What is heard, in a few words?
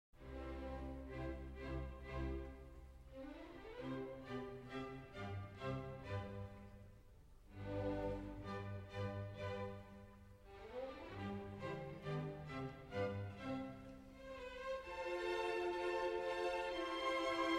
Piano Concerto in C Major, Allegro Con Brio; Beethoven; Piano Concerto No. 1; Op. 15 I.